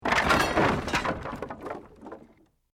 Wood Collapse

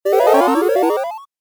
Magic Fx Video Game

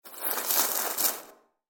Warehouse Searching Through Garbage; Foley Sound Effect; Andrew Fleming

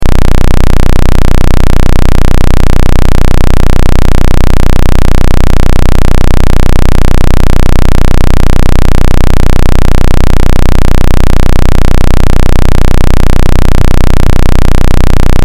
Test Tone Square; Square Wave 15.525Hz 60 Secs 0Db Loop; Tone Sound Effects; Blastwave; Technology

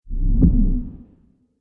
Trailer Hit Underwater

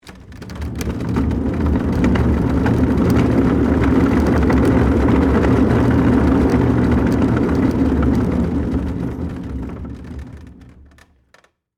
Wood Work Table, Roll, Push, Wheels, Long; BlastWave

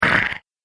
Monster Short Snarl, Monster Sound Effects; horror

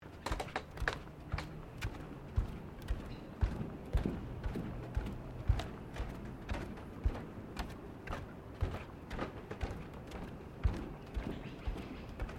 FOOTSTEPS BAREFEET WOODEN DECK SEQUENCE; Footstep Foley; Human

